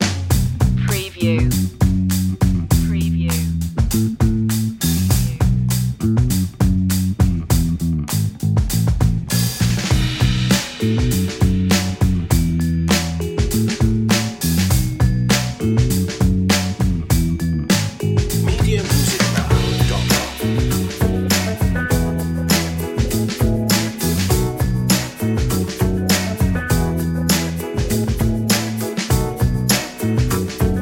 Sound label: smooth deal